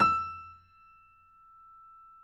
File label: piano; East West; The Ultimate Piano Collection